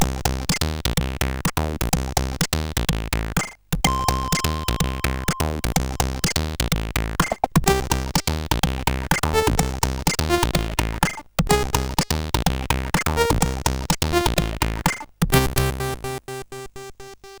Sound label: sid CSG8580R5; Alien 8